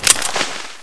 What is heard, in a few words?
p900 draw, weapons